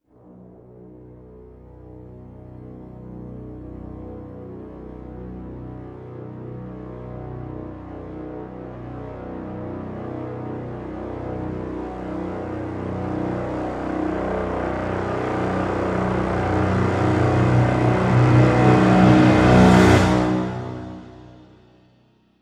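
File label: crescendo brass swell lo B; brand x music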